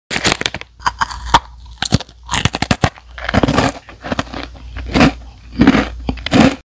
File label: eating biscuits